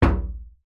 impact metal knock hollow

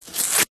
card cereal box rip

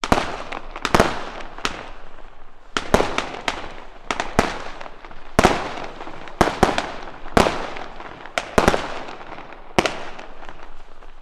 fireworks multiple explosions; sound effects one

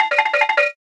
comedy running; sound effects one

